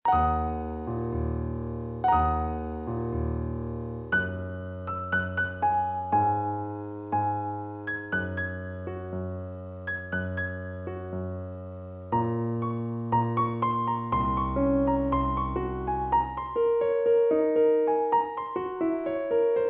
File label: Piano